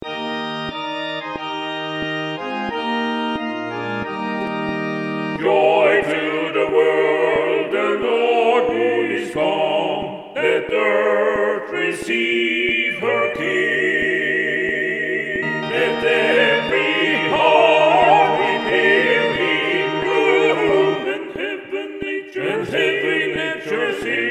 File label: TUTTI CLICK; Joy to the world